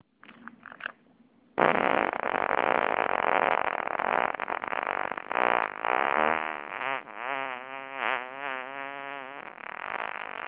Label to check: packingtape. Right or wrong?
Right